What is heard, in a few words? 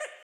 Duck, Old Skool House, 120bpm; Big Fish Audio; Construction Kits; House of Amnesia